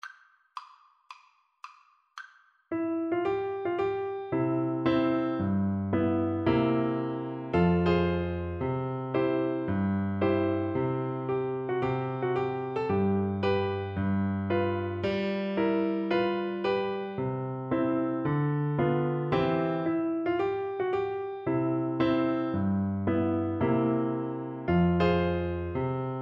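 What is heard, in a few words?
recorder karaoke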